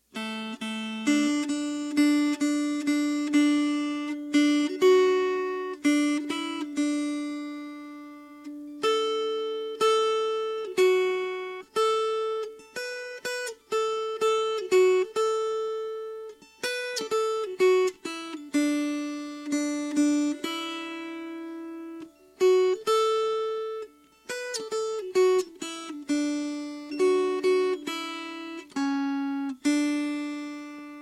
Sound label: Melody; Mister Rabbit